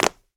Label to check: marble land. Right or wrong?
Right